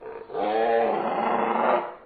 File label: t-rex roar